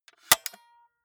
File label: walkman close medium